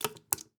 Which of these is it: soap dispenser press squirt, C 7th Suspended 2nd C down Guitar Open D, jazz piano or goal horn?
soap dispenser press squirt